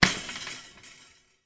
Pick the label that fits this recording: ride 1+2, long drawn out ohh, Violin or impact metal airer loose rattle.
impact metal airer loose rattle